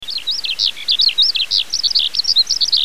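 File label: skylark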